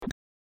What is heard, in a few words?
Chip Pressed; My Cute Commissar